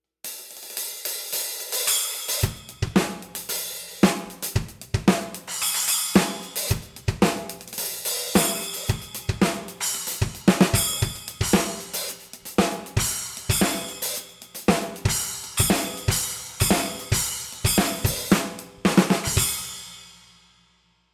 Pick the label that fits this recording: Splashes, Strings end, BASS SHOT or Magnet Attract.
Splashes